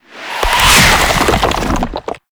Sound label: Sand Spawn; Warframe; ManInTheWall